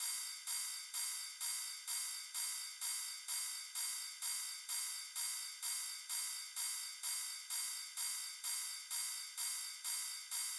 Top Loop - 128 BPM, house top drum loops; Code Red; Cymatics

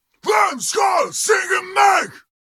Battle Cry, Viking Vocal, Un-Processed

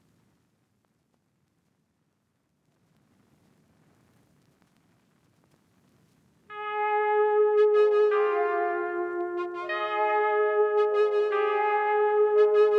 Nostalgic Melody Loop 150 BPM F Min Arp; Melodies; Loop Stems; Cymatics; Oracle Sample Pack

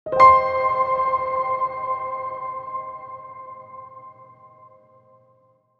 Light Keys Cm 65Bpm; Synths; Ghosthack

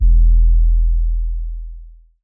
808 E, Basses; Cymatics; Eternity Sample Pack; 808s

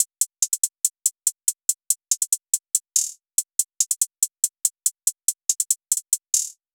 Hihat Loop - 142 BPM, Hip Hop; Animal

